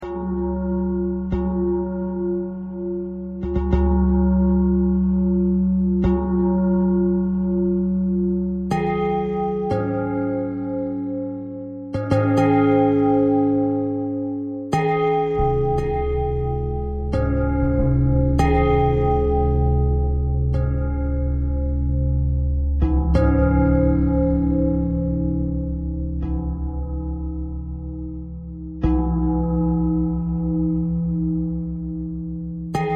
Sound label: bell thread pentatonic; bells